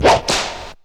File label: FX; AMG Megabass REMIX! VOL-2